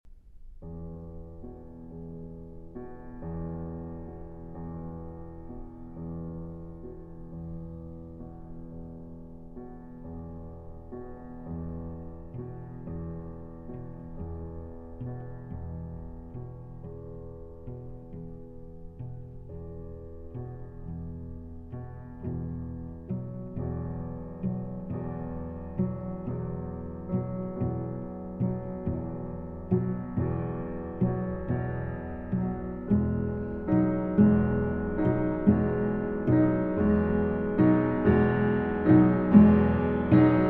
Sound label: Trio, Dolcemente, a Mourning Song